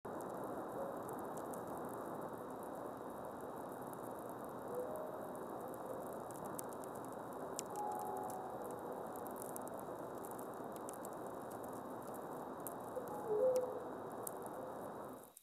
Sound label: Radio